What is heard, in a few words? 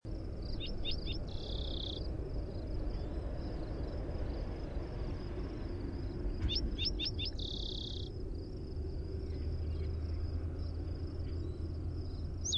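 Spotted Towhee Primary Song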